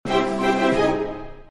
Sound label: mail